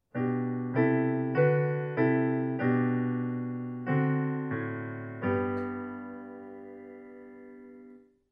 Parallel thirds in bass

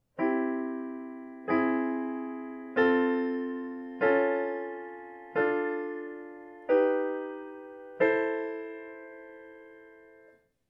Progression using augmented passing chords

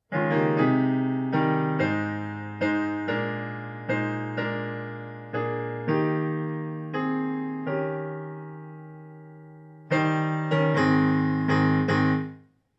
Slowing out of tempo

